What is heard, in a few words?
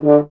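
French Horn; Miroslav Vitous; Solo Instruments VOL-2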